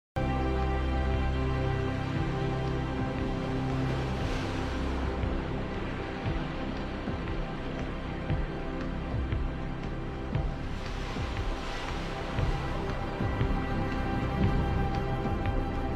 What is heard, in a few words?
Sirens Whispering